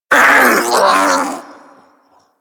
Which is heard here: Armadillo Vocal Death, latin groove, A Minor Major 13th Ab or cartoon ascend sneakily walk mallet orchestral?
Armadillo Vocal Death